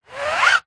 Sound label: foley fingers rub scratch canvas art picture short